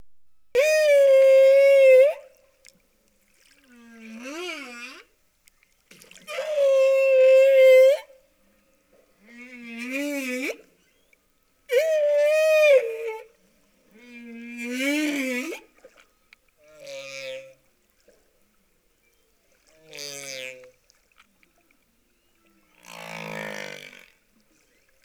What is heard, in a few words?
song, whale